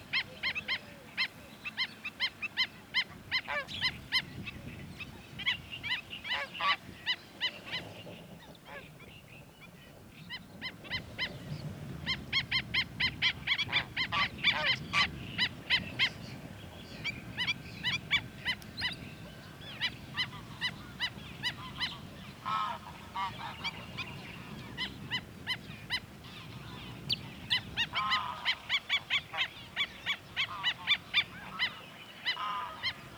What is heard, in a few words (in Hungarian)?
golyatocs hangja